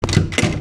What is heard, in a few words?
door open; ドアを開ける